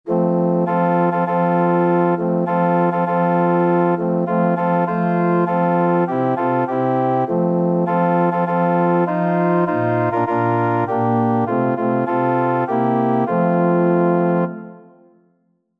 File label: ill live for him, organ